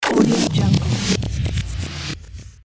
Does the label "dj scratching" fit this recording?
Yes